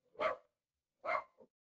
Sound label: animal dog bark